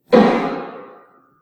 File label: piano